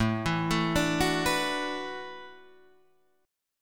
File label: E 7th Suspended 2nd Suspended 4th, A